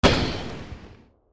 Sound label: shot